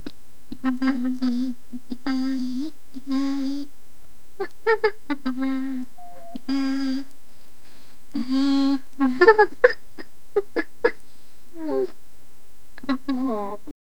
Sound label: bored and annoyed pouts, boredom